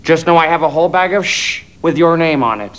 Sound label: shush